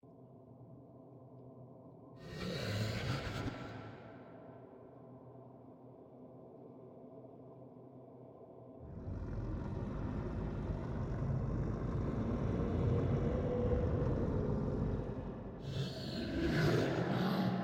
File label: AMB Opening Main Hall